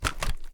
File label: kilo98 reload scope end shoulder; gmod; viper